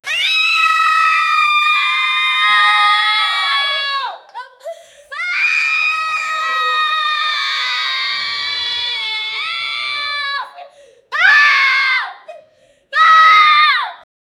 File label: 10 year old girls scream; humans